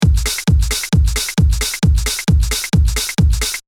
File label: kick Loop